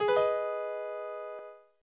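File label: A flat minor, piano; Abmin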